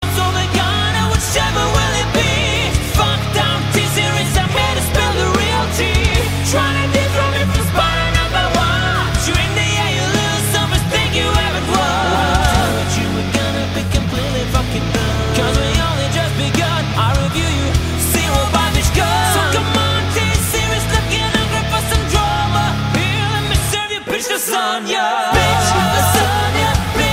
Metal

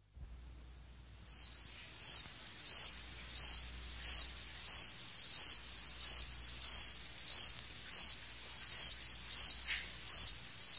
Crickets

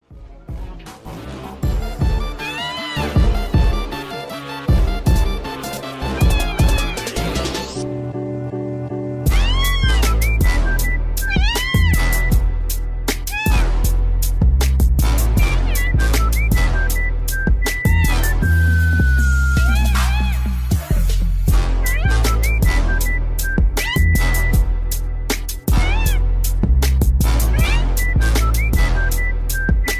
Hip Hop; FF7R; Chocobo

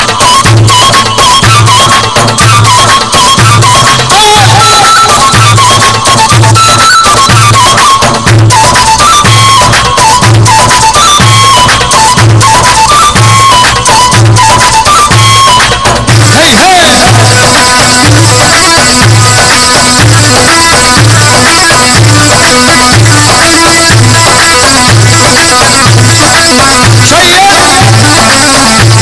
Dabke Lebneniyi

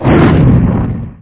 Roar

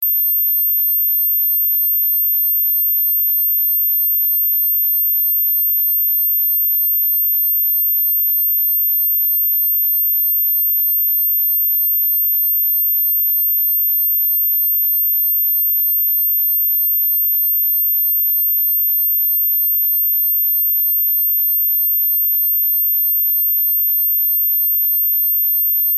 Dog Sound

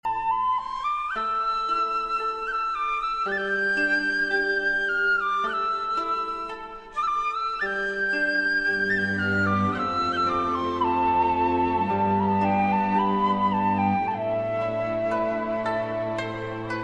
Nice Flute; Inuyasha

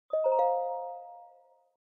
Interact sound; BOTW

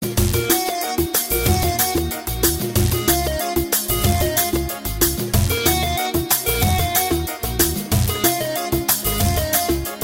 rai

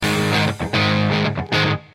Guitar Sms

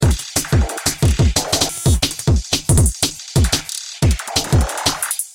Snare

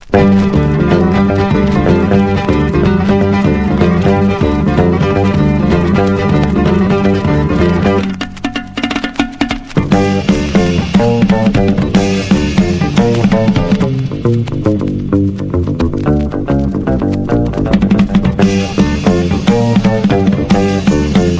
anatolian rock

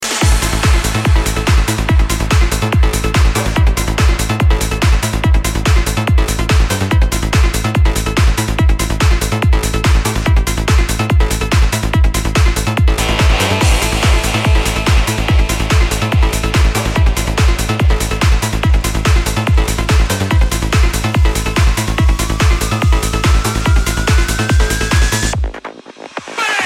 Trance

